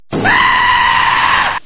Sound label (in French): cri tombe; cstrike